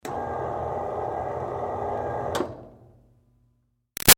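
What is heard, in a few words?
Projector Screen close up Up Medium; Offices; Projector Screen Cu Up Med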